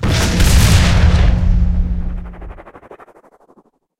killscene pierce, tanks

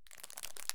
MRE draw; Escape from Tarkov

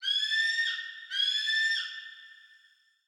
ambient desert birds

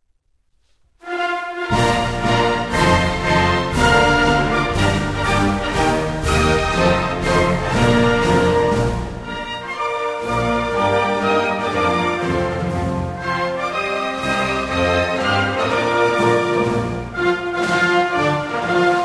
Music; DCS

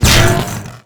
small explosion

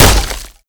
impact wood 20mm; weapons; Modern Warfare

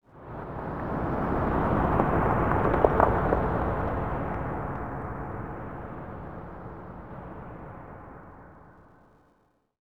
city occasional

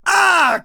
hit; Escape from Tarkov; usec2

